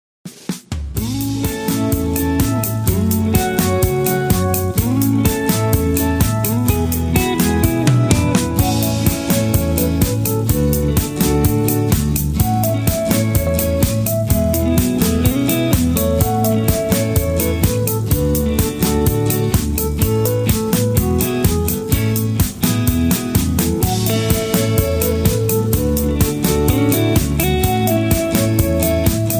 Instrumental with Leads

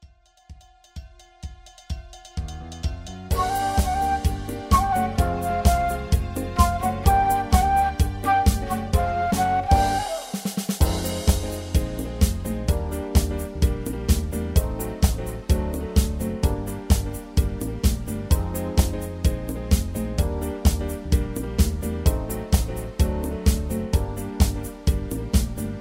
Instrumental

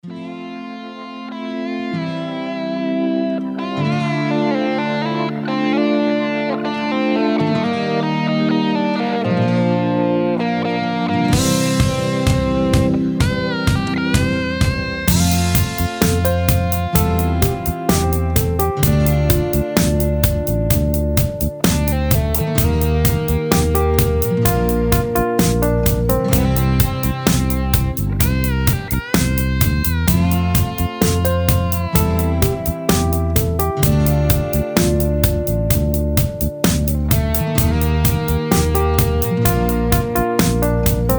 Instrumental Melody Full Solo

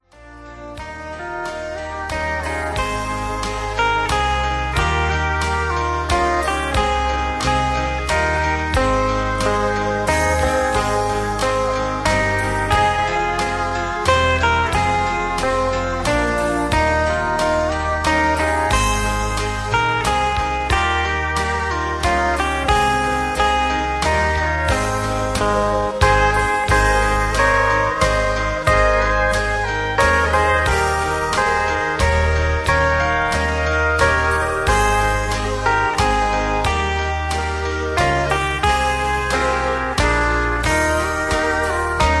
Low Key with Soft Leads

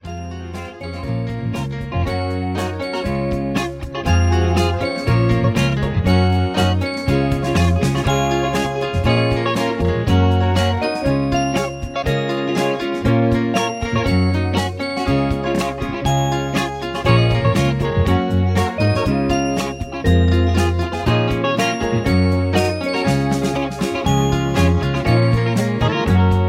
Instrumental Higher Key

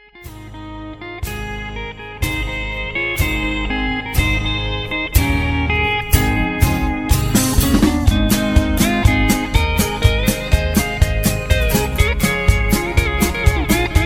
Instrumental

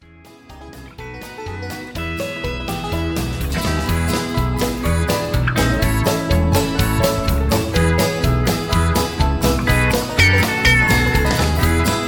Instrumental

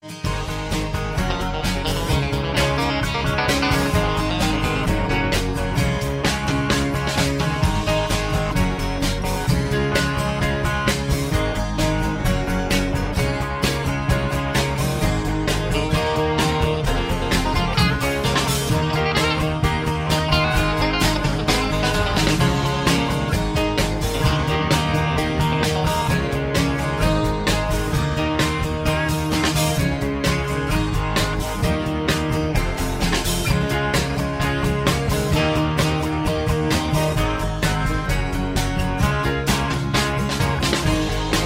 High Key

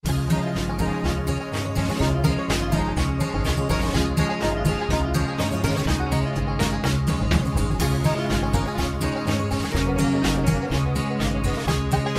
Low Key

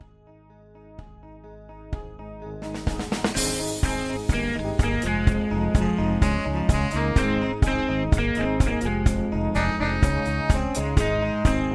Instrumental Lower

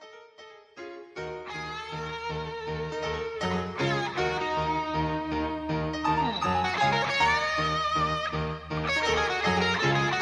Low Key

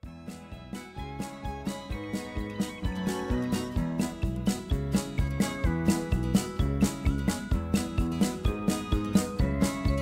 Patter

